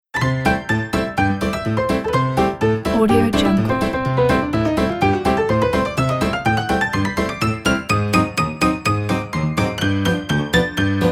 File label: retro piano opener